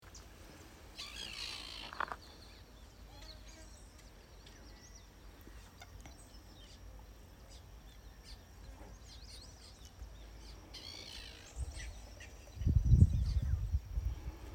common tern

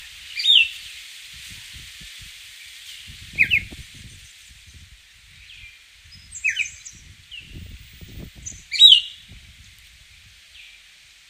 pearly eyed thrasher song

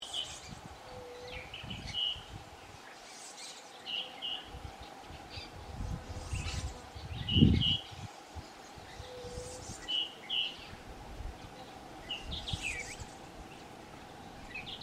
yellow oriole song

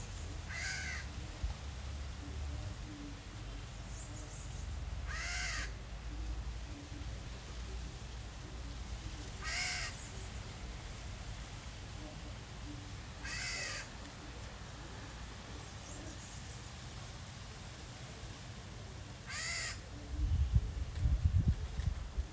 pearly eyed thrasher

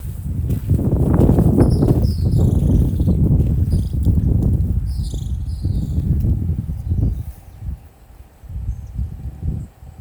tropical kingbird

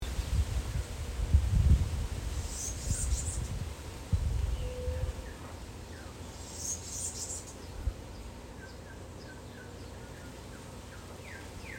bananaquit

